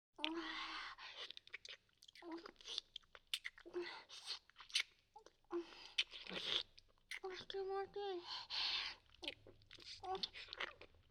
フェラチオ; SEあり

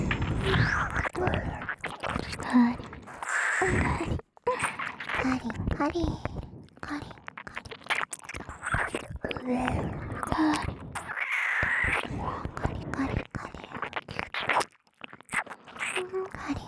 両耳舐め＋カリカリ囁き責め; 汎用性抜群オナサポ素材編

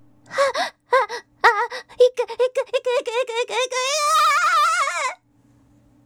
叫びながらイク; 特典エロ台詞

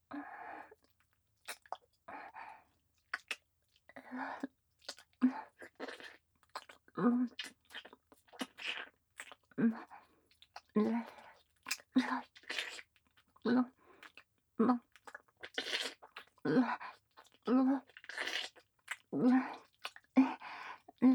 フェラ 舐める

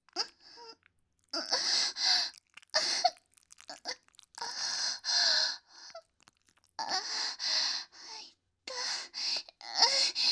挿入 喘ぎ; SEあり

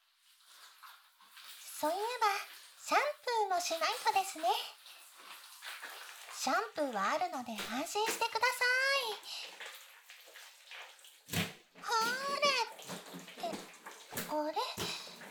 シャンプー

